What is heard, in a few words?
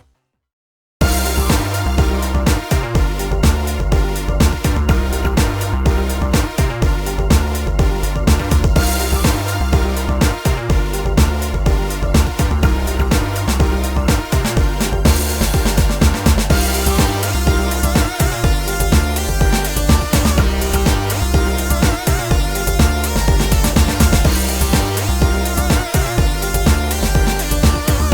Duel Music; YuGiOh Over the Nexus